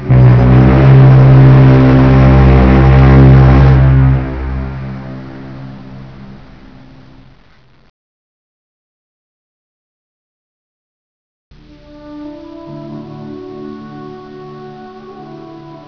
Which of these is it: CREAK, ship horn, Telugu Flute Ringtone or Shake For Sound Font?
ship horn